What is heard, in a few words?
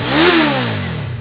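engine boost; Redline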